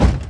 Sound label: Hit; V-Rally